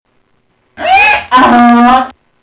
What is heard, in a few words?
Donkey sounds